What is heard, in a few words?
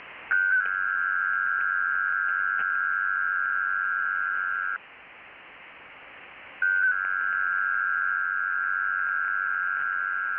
WINMOR 500Hz 4PSK 93-75Bd